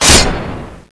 ghost hit

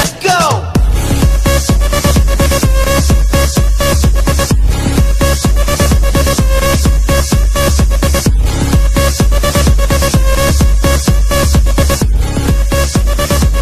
electro; roundsound

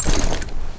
draw

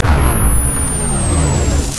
fire laser

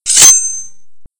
javelin; jailbreak

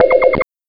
healing; cstrike; ctf

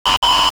scary

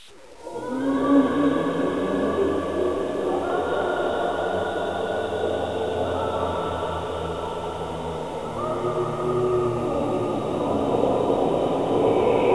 zombie; jailbreak

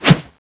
wind knife slash

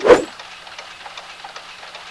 knife slash; spknife

